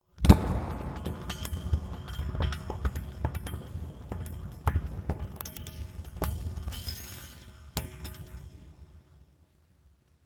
sci-fi metal boiling metal spill; Alexander Kopeikin; Sci-Fi Metal Elements HD

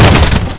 bust crate, debris

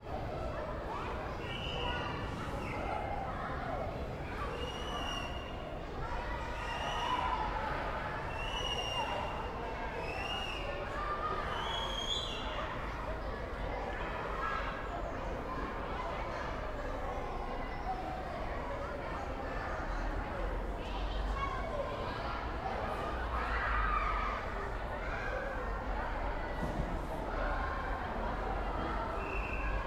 CITY Schoolyard Children Walla; Systematic-Sound; General Ambience Series

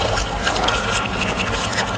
airmine amb; hl2; entity

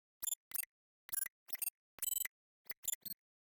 dark-ui computation analog; The Sound Keeper; Dark Sci-Fi UI Sounds

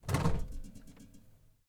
small fridge open; 3maze; Doors

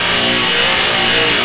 engine; hl2

